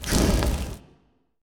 SFX Fireball Cast

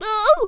pickup rock; Scrat

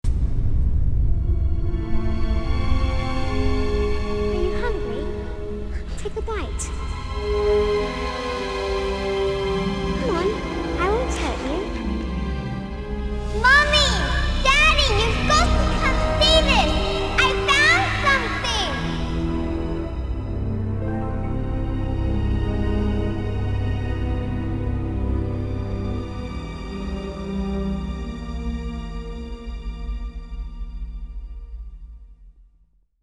Beach Ambient

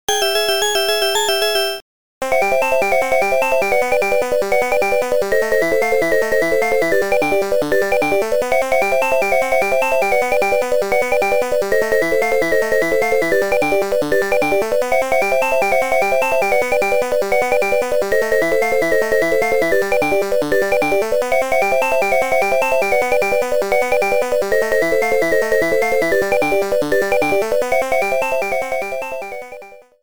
Hurry Up Music; Dig Dug II; arcade

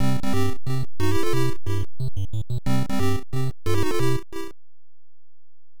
Game Start; Arkanoid; NES

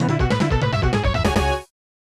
PK Announcement

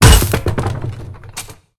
Character Body Impacts

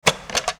open latch; a dump in the dark

